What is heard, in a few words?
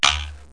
sprong, beeps boings; KABOOM! - Wild and Wacky Sound Software